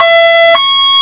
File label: hilo, sirens; west mids